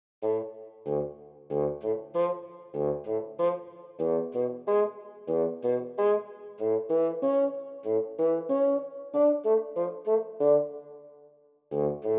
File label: Bassoon Arp THEME B; Factory